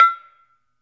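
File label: SARAN E5, Metalophones, Pitched Percussion; E-MU Producer Series Vol. 7 – Old World Instruments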